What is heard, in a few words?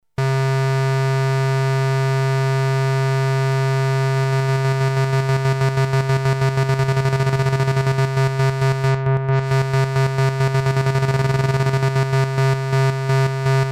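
test LFo vca, analog polysynth; Solton SM100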